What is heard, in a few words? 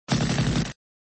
Sound Gun sound; flipper